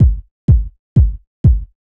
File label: DEEPHOUSE BEATS 125